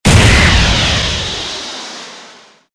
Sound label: apach hellfire